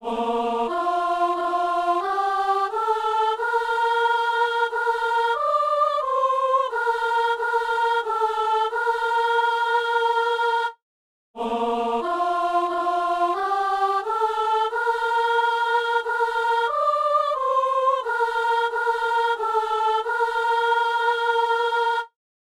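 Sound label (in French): prières universelles, soprano; Messe du 17 janvier à Saint-Denis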